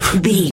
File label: voice male breath quick